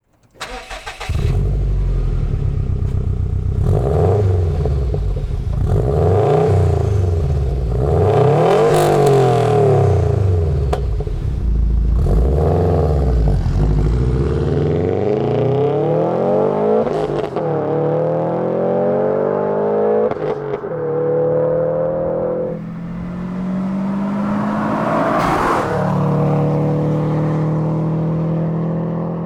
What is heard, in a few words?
soundcheck